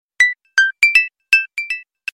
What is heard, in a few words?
lumia 800 sms; ringtones